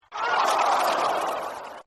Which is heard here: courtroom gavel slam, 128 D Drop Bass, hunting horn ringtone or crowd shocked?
crowd shocked